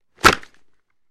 Football Helmet Pla, football

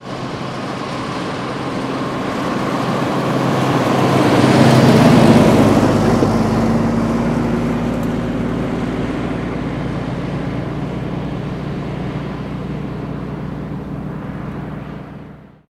Jeep By 30 Mph, jeep cherokee 84

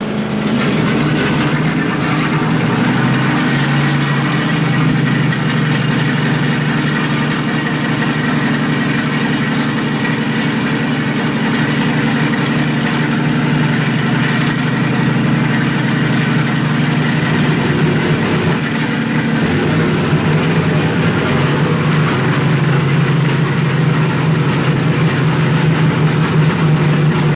B29 Bomber Strt Fst, b-29 superfortress; B-29 Bomber Start Fast Start Then Idle

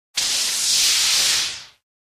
Synth Energy Zap Whoo, zaps; Synth Energy Zap 12; Single Air Whoosh Burst.

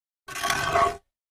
2x4 Dragged On Cement

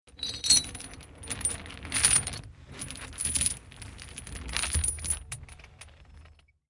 Chains Drag Rattle

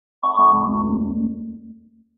Stab Muffled Liquid

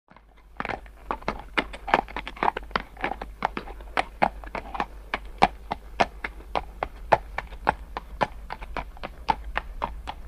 Away Horse Away At Wal

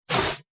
Short, Light Fire Extinguisher Air Blasts, X5

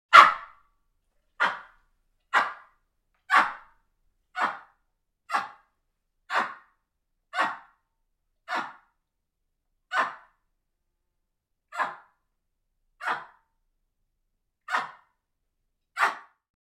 Baboon Chirp Series

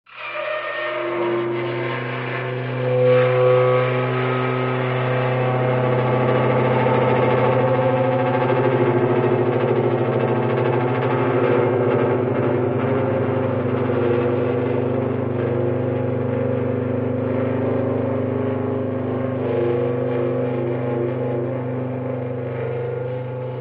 Dry Ice Eerie Metall

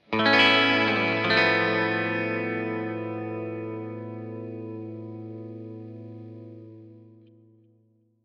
Elect Guitar, electric guitar

